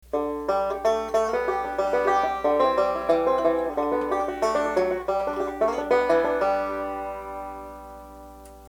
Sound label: banjo lick